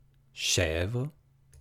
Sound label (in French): chevre